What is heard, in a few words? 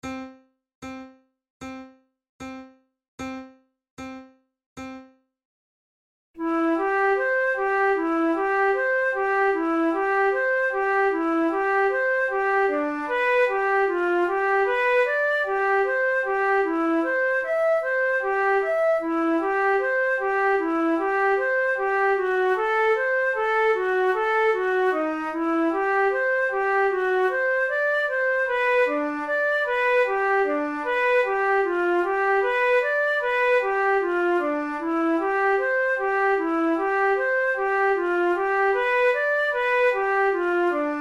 duets, flute; forty progressive duets